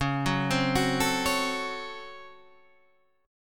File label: C sharp Major 7th Suspended 4th Sharp 5th C sharp, Guitar Half Step Down; chord